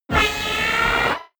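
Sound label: rumble storm short, science fiction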